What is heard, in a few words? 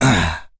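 Vox Landing, English; Dakaris; kingsraid; voices heroes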